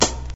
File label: noisy snare one shot f key, snare drum sound tuned to the F note; snare drum samples